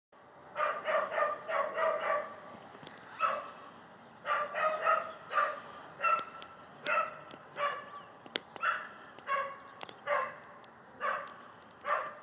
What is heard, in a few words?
barking dog